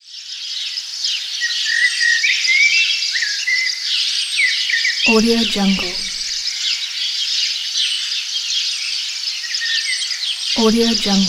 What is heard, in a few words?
chirping birds